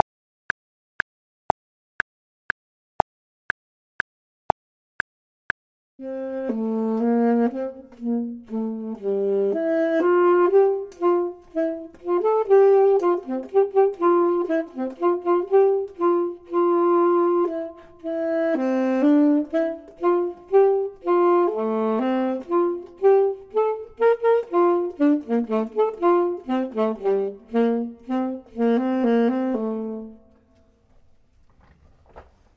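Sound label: bottom, Saxophone and Clarinet; voxman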